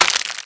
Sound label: Crack